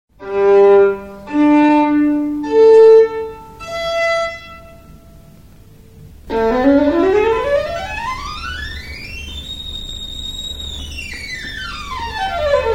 violin

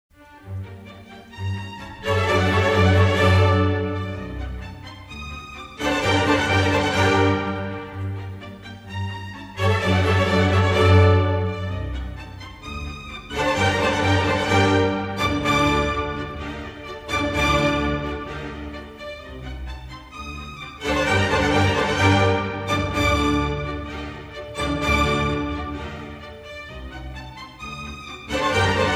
Exposition; Mozart Sym40 4th Mov

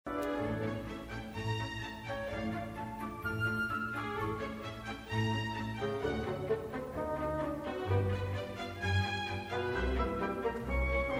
Reorchestration; Mozart Sym40 4th Mov Dev

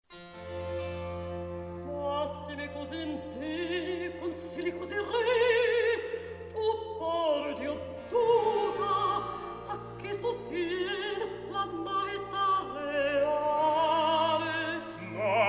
recitative; Baroque

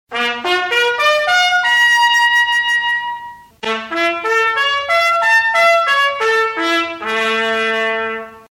trumpet

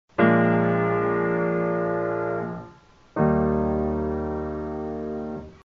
Dominant to Tonic Chords

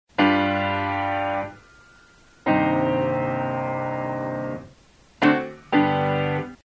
Two Closed Cadences

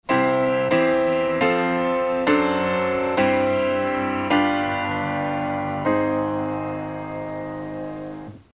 Deceptive Cadence

